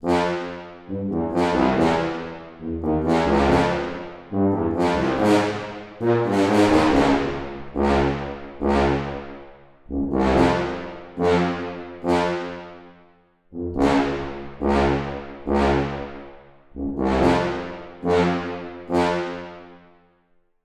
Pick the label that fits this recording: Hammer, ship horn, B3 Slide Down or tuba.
tuba